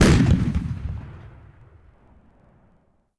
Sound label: Artillery Fire; Civ4 warlords